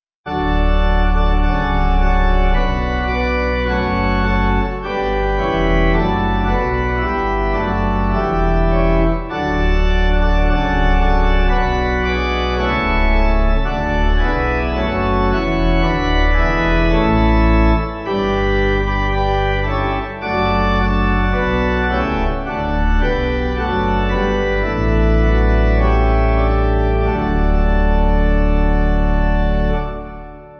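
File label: Voices Raised To You, Pipe Organ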